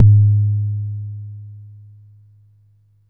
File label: Long BD, Jomox Xbase-09